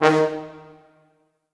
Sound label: D#2 R, Orchestral Brass